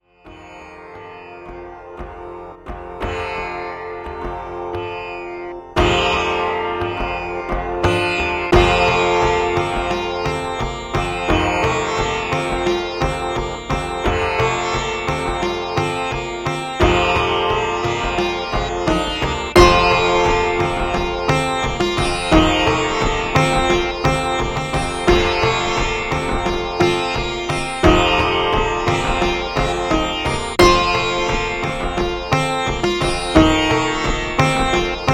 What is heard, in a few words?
Virtual Sitar and Ethnic Indian Percussion Kit Tabla; VST Plugins; Syntheway